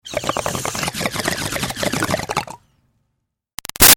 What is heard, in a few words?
Bong Water Pin In-Out Inhale Bubbles Short; Pin In Then Take Out; Nature